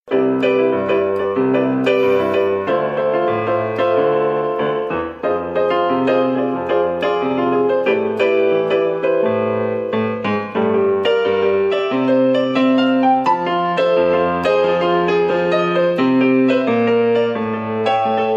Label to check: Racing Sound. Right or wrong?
Wrong